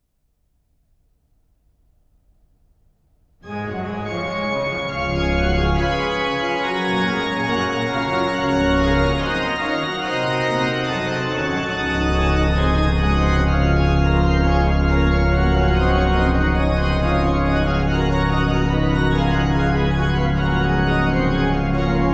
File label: Praeludium et Fuga in C, ORGAN; BWV0545; BACH; BACH ORGAN WORKS